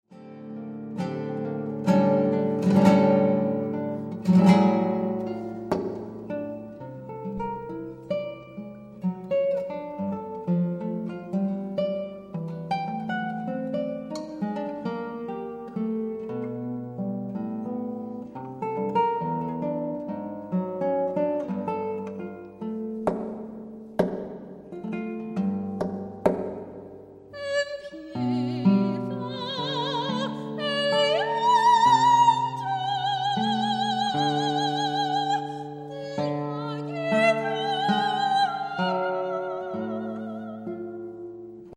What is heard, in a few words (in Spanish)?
La Guitarra